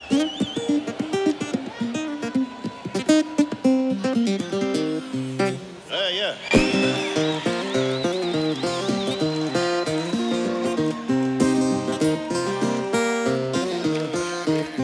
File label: Martin 000 40S; Sevilla 2015